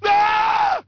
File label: M scream; People